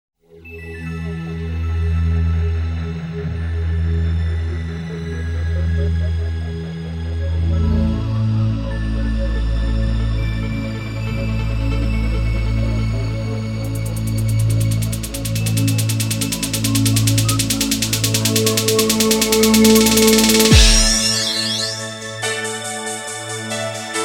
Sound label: techno remix